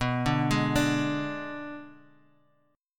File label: B Minor 6th B, Guitar Half Step Down